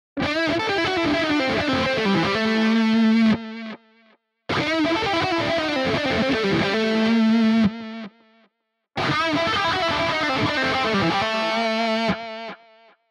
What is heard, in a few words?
lead full Humbucker, pickup; panico pickup hb3 humbucker